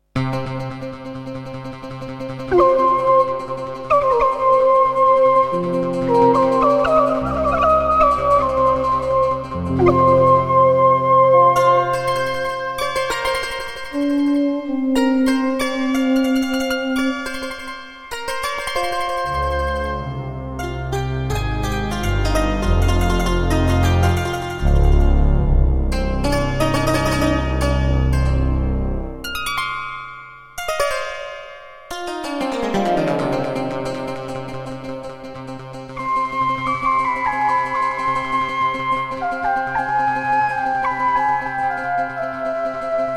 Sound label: Roland CM-32L; Prince of Persia 2 - Intro